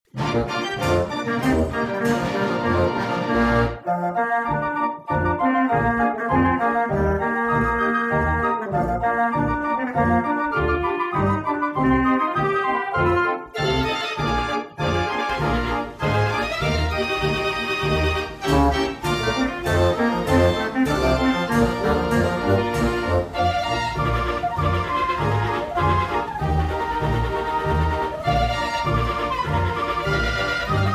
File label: BELGIAN, band organ